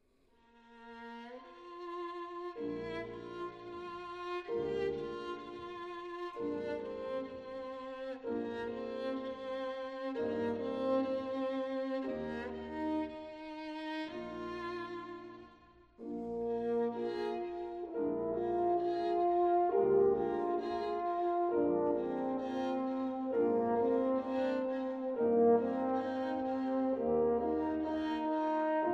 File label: Trio For Violin, Horn & Piano in E flat - Allegro